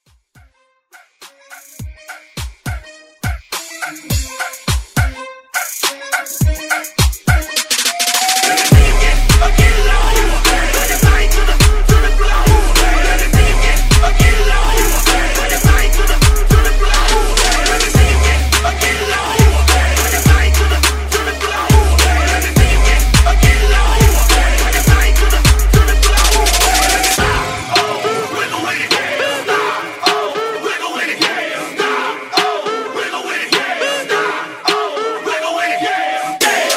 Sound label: Blend Hype